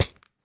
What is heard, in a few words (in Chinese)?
锁链踩踏声